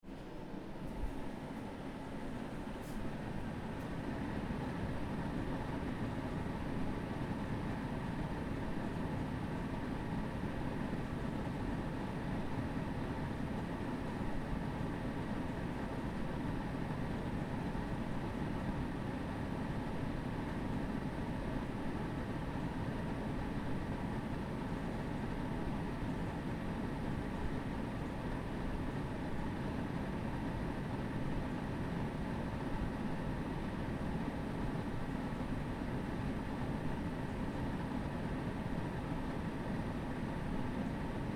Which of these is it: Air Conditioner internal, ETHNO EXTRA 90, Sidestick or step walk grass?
Air Conditioner internal